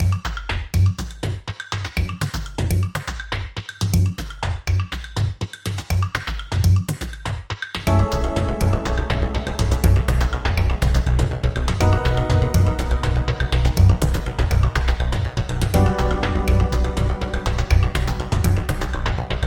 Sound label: Tense; VA-11 Hall-A Prologue OST Sounds From The Future